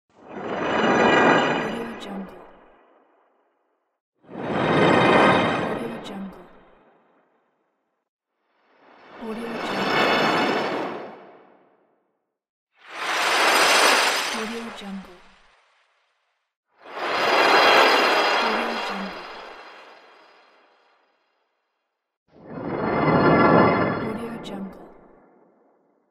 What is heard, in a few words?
woosh